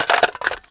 mag place, weapons m14; Urban-Coalition; Decay; arccw ud

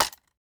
grab; Benny Placeholders

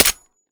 mech, glock; weapons; Urban-Coalition; Decay; arccw ud

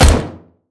fire first int; weapons; m16; arccw ud

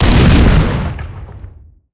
fire, m82; weapons; Urban-Coalition; Anarchy; arccw ue

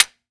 dryfire, glock; weapons; Urban-Coalition; Decay; arccw ud

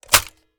mag tap, g36; ARC9 uplp fesiug

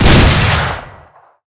fire, m16 weapons

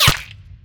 impact, violence; Benny Placeholders

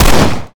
fire, glock; weapons; Urban-Coalition; Decay; arccw ud

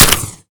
mech; arccw ud

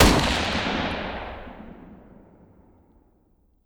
fire dist, m16 weapons; Urban-Coalition; Decay; arccw ud

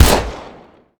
fire, uzi; Urban-Coalition; Decay; arccw ud

